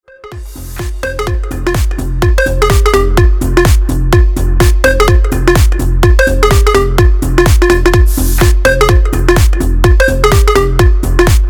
klubnyj zvonkij trek

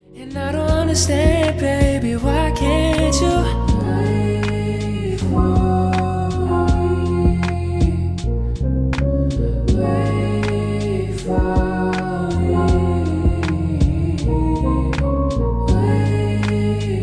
spokojnaja melodija

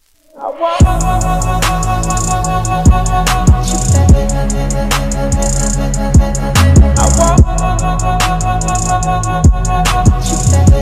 rasslablyayushchiy zvonok

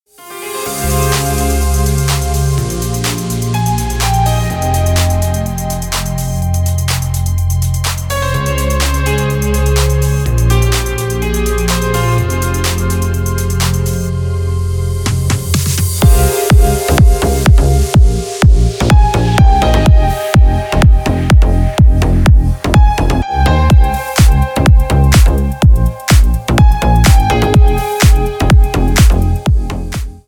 narezka 90kh

